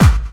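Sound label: snare drum bass drum, Dance Mega Drums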